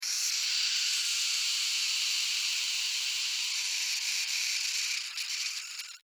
Insect Voice Pain, Insect, Voice, Pain, Vocalization, Bug; Sound Effects:Animals:Insects; Blastwave